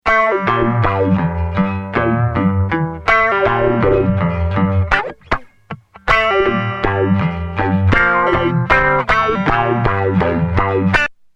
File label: Guitar Tube Comp EQ